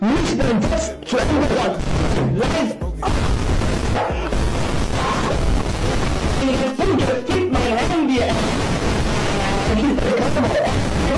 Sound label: ahhhh